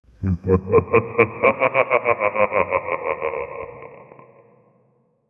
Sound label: evil laughing sound deep